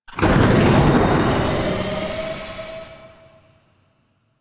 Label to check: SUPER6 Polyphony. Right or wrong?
Wrong